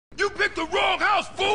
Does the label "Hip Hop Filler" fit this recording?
No